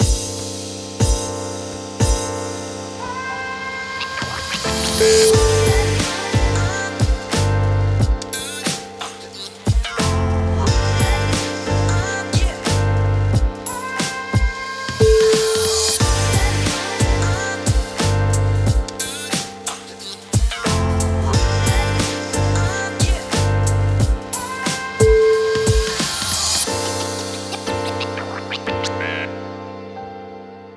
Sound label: hiphop